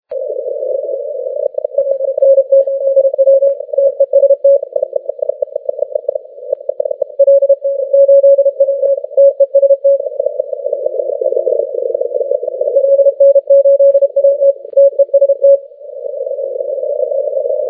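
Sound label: 10m Morse code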